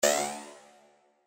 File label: battery on; SLOTS; Cyber Angel